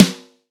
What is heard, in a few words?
small reverb acoustic snare sound f key, Royality free snare tuned to the F note.